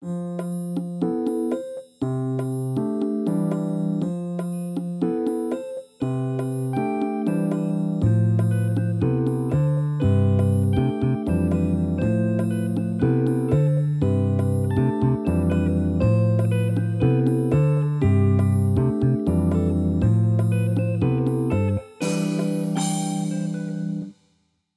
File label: Happy Quirky Theme